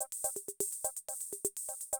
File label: CR78 125, drum loops